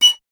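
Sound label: flute piccolo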